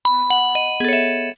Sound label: Tone, Message; LG; KE970-Shine